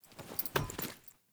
Foley Action Default Polearm Combo D To Idle, Default Clothing; Player